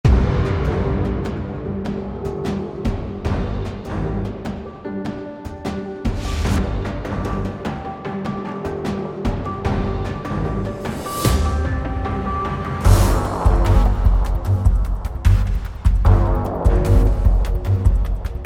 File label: battle; arena breakout